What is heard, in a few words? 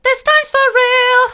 this times for real hard, vocal